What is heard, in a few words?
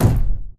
Footstep Jog Mid; Titanfall 2